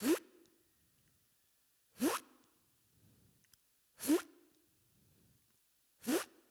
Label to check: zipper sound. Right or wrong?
Right